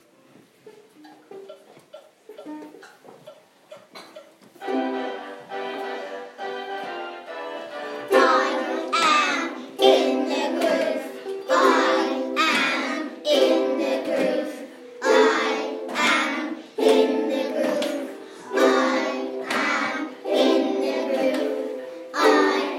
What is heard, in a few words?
ukuleles accompany singing; year 4; year 1